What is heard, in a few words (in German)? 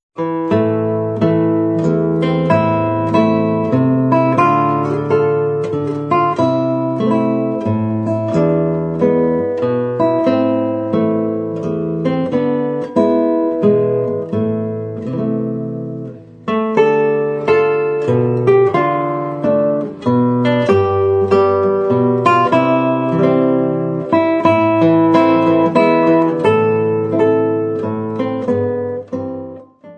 Modinha, brasilianisches Volkslied; Saitenwege 1